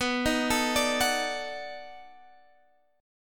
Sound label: B 7th Sharp 9th, down